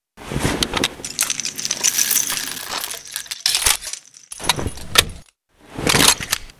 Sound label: Vehicle reloads; Red Orchestra Ostfront 41-45